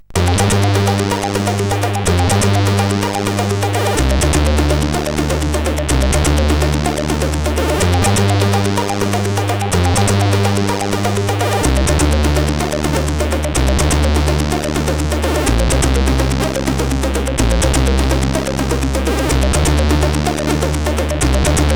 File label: Tune, CSG8580R5